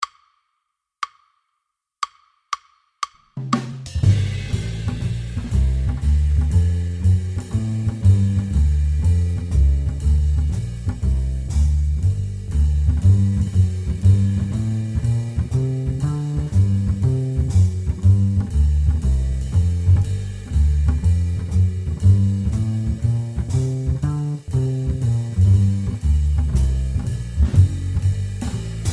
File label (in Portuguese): Base Jazz Lick Gm bpm 120